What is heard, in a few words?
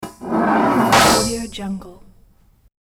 manhole cover dragged and dropped; hollywood edge